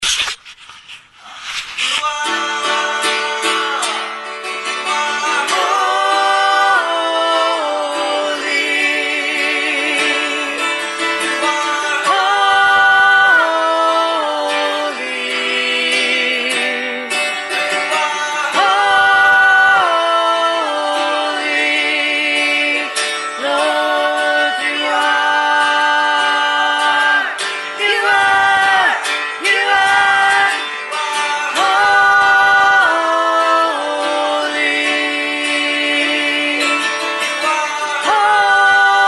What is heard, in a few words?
soprano